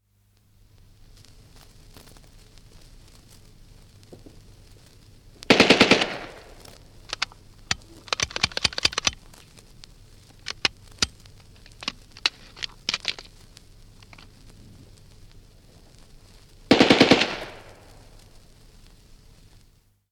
Short B, Short burst of a Bren gun which jams, then further short burst; from 78 disc; Machine Gun; BBC